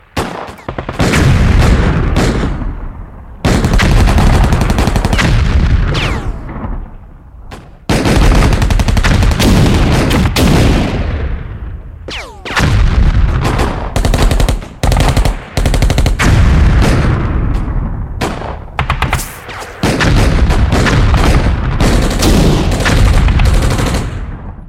baller sounds fuer handy military

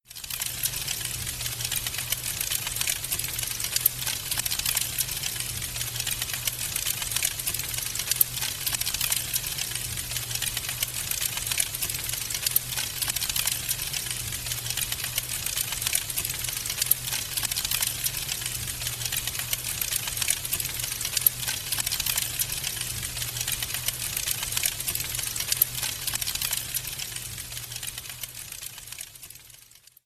klingelton kaminofen kamin feuer knistern sound

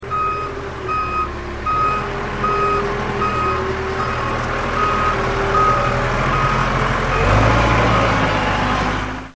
lkw piepen reverse

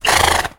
Pferd Pferdegalopp Pferdegewieher and Schnauben sound

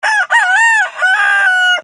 hahn; hundechor

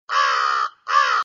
kraehe; vogelgezwitscher

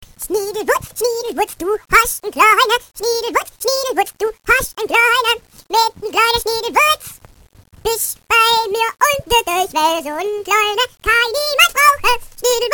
meckernder Wecker; schniedelwutz

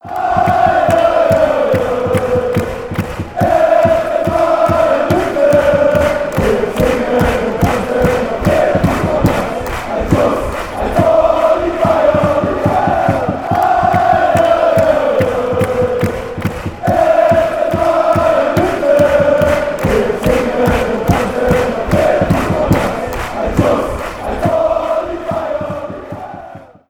klingelton torhymne; fc bayern muenchen e.v.

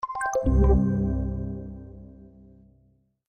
icq abmelden

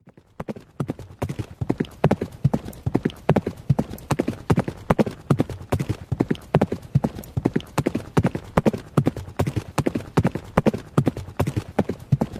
klingelton pferd pferdegalopp pferdegewieher and schnauben sound

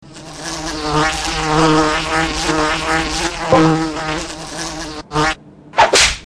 klingelton fliegenklatsche

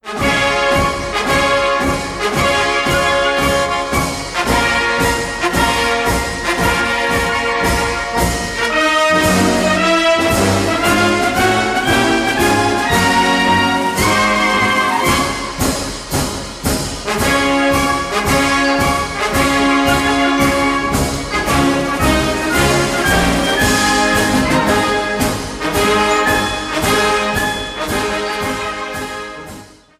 Marsch Klingelton; badenweiler marsch; badonviller

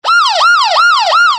polizeisirene

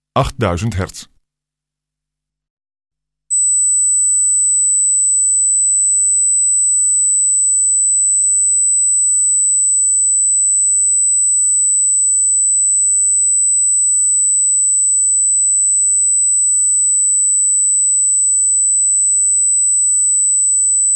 nokia klingelton in hoher frequenz 8000hz

